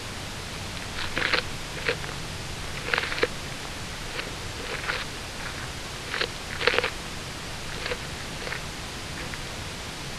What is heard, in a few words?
mole cricket